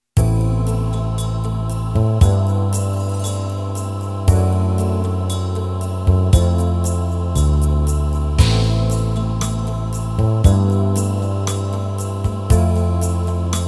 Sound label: Yamaha PSR-550